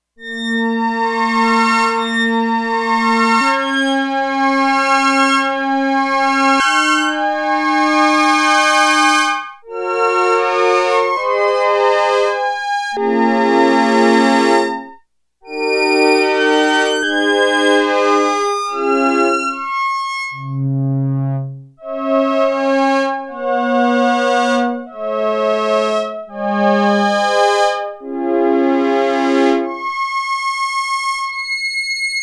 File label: High Strings